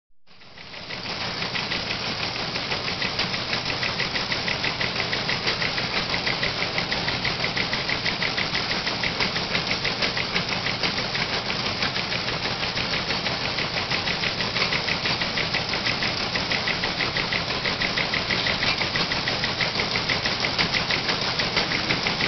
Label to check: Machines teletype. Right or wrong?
Right